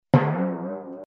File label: boing; disney; mashup